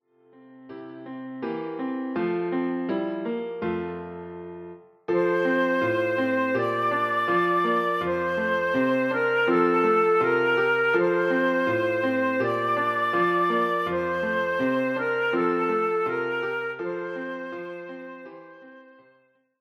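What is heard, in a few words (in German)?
O du froehliche, Querflöte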